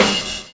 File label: CRASHING; Univers Sons